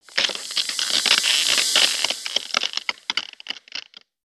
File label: Ice Crackle